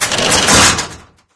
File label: slider gate close; ambient; source engine; ep2; outland